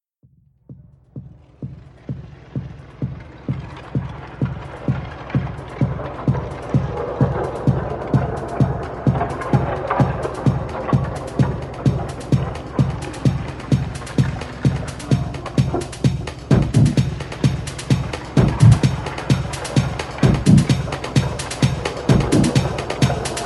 Got Woodblock

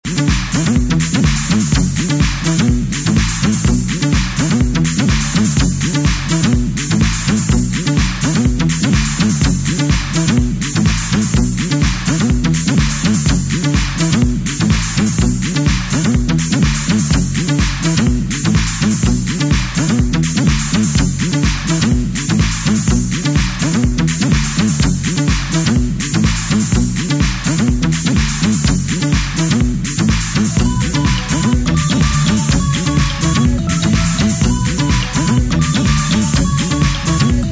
bassline; reese; KMS Records; Detroit

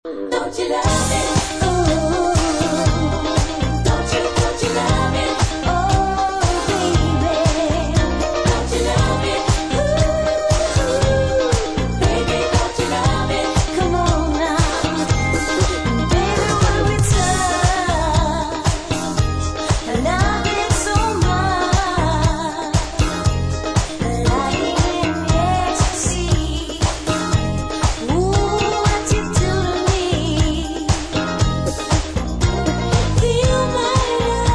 vocal

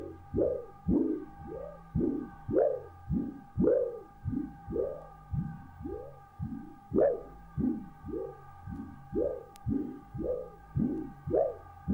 Bass Coil

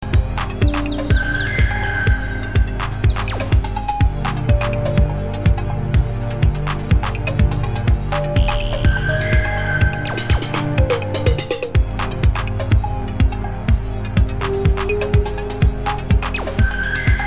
Dark String

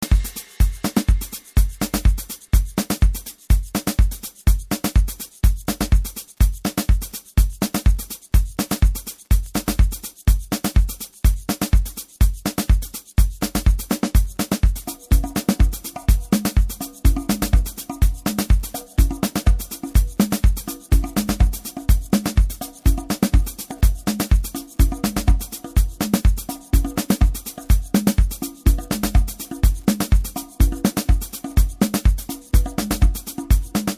Service (Drums)

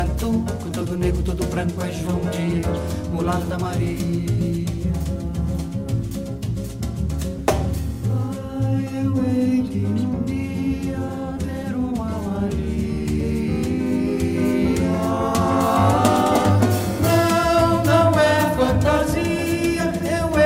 Batucada

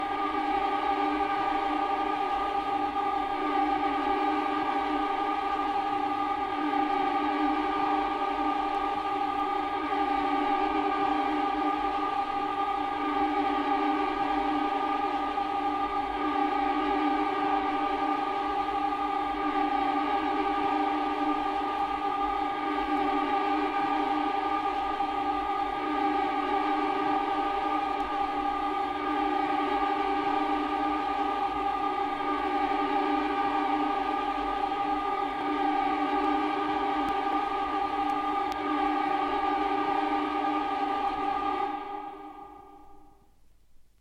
Suspense Ambient; Bloody Moon O.S.T.

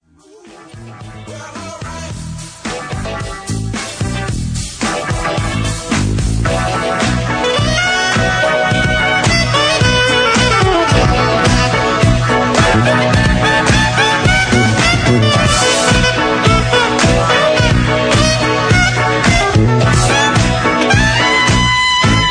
disco jazz

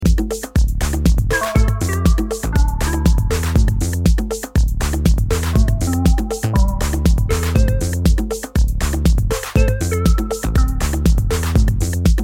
Latin Acid Bonus Beats

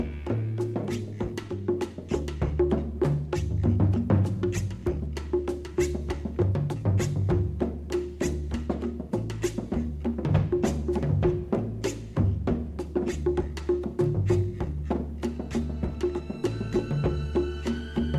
Piano Diabolico

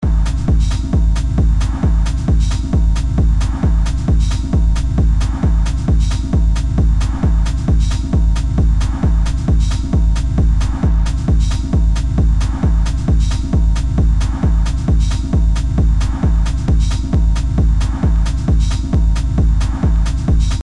Serpent (locked groove)

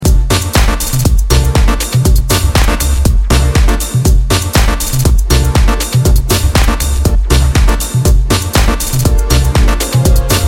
Instrumental

